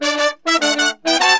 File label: 100, BRASS SAMBA; Best Service ProSamples vol.14; AKAI